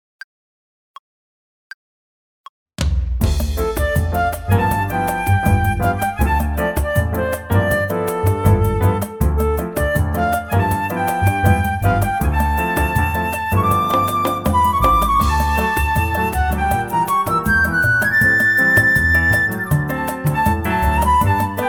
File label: flute, D